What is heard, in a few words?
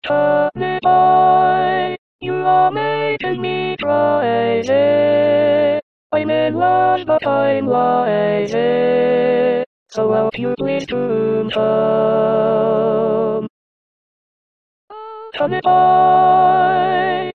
Alto